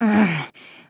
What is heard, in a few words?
harpy idle; Seer; GamigoNA